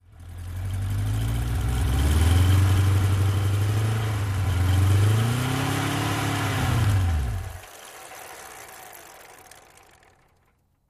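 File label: Motorcycle, Kawasaki 750, Idle Close Up, Rev, Cut Engine, Drivewheel Free Spin To Stop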